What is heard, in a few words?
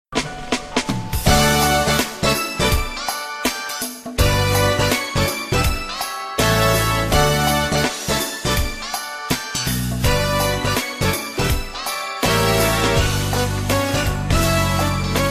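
loop elevator 82bpm; SuperEpic The Entertainment War; loop ascensor 82bpm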